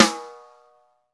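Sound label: Snare, Roland; Club-50 - Foundations; Naturals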